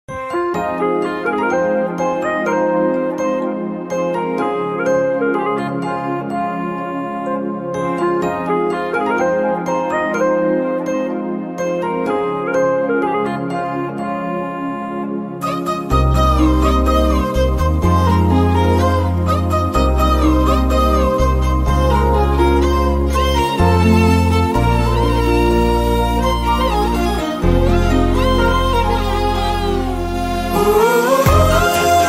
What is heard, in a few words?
love bgm ringtone